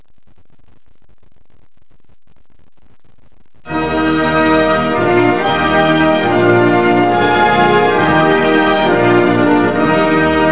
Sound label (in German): Deutschlandlied